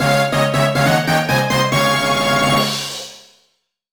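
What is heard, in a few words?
Jingle Congratulations; Shoukou Shindan Training DS; NDS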